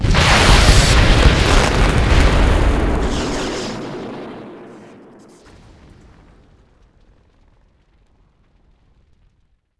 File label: ICBM Die; Civ4